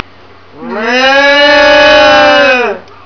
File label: moo